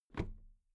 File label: toolbox close drop lid very soft